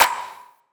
clap one shot, Pop drums; Slate; SD PASADENA Pop Sample Pack

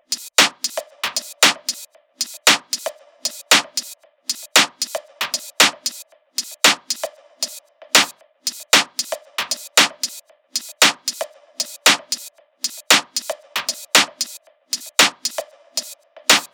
tops 115, Drum Sample Pack Loops Top Loops; SD SEISMIC; Slate

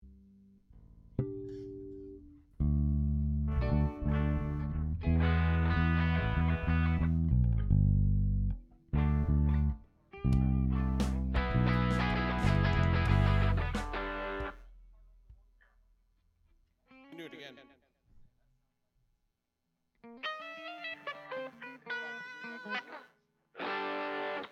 Strings Mix

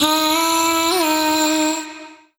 Vocal Sample Pack Phrases B; Slate Digital Omnivox Vocal Sample Pack

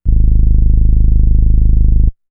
Synth oneshot Longer Ab, Synth Bass; Synth Bass One Shots; Slate Digital BASSHOLE Sample Pack

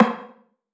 Perc Rim Knock, 909 Percs, Vintage Drum Machines; Slate

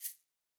shaker single hit

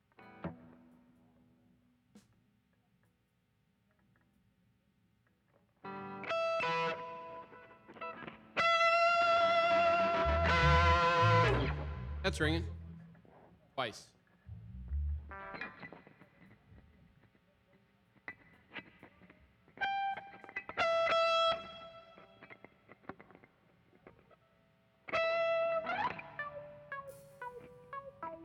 Vocal Emphasis Mix

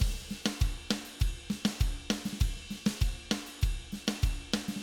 Cumbia Chorus

